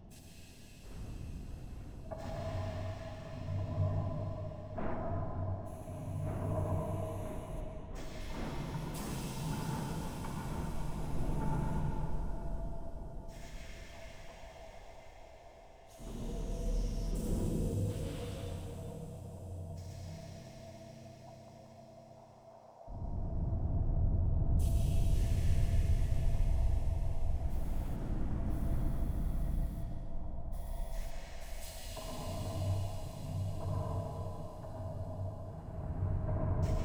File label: atmos deep docks interior